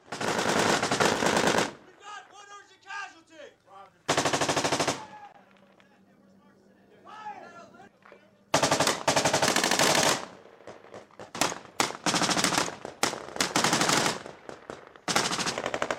Real War Marines In Firefight Gunbattle Raw Audio

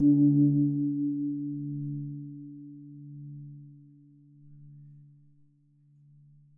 gong

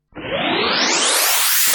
Phaser

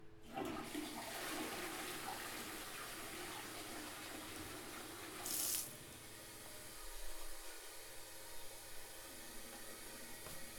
Ambience bathroom

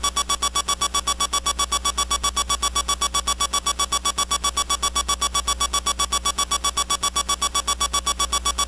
Remote Control

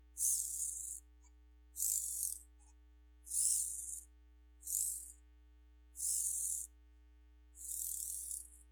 Servo Motor

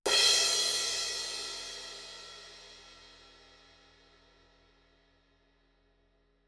Paiste 2002 18 Crash Softer Hit Cymbal

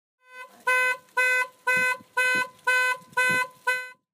Car alarm

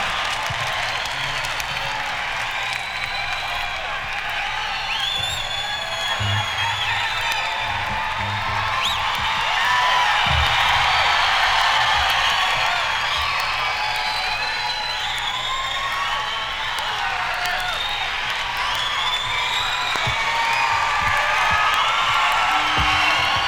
Audience With Loop

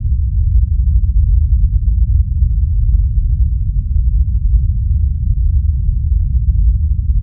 Brown noise low freq carpet

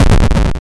8bit Explosion

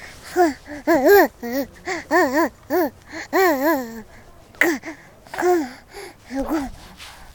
Baby Happy Babbling

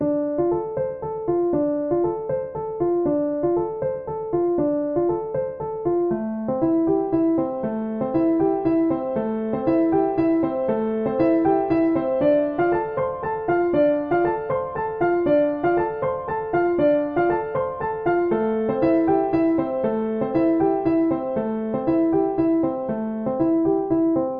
Piano Loop

